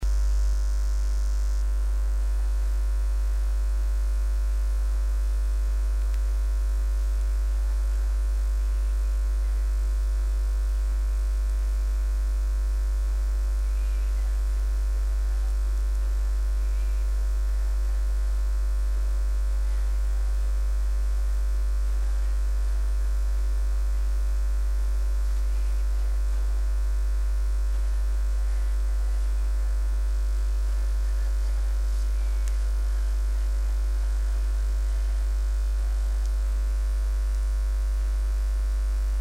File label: umi acoustic